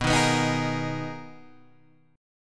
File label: B Major 13th B, Guitar, Standard; 7,6,9,8,9,6; strummed; capo=6